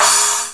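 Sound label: CYMBAL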